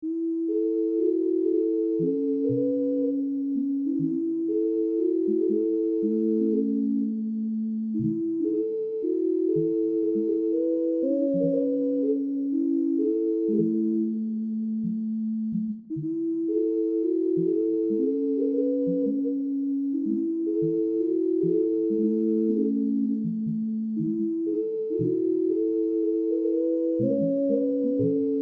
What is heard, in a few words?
lullabye